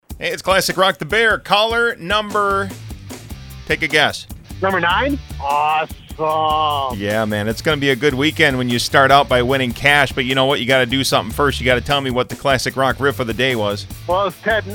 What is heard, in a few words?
riff